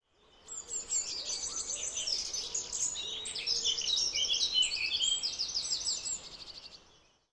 scott bird; quake2; jitspoe

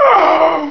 death; quake2; qguy

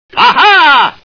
ahha; quake2; chaos

